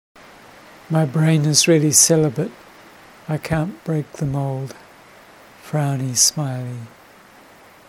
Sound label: rain